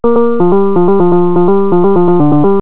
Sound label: tune